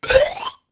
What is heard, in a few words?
Moveflutes